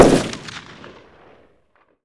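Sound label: colt m4 exterior shot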